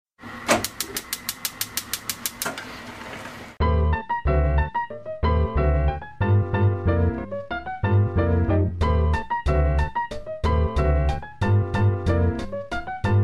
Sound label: Ramen Beat